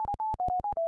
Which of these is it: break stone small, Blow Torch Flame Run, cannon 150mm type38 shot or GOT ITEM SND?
GOT ITEM SND